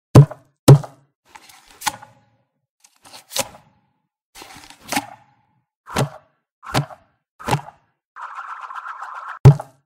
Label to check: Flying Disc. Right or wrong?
Right